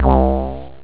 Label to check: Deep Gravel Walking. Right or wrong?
Wrong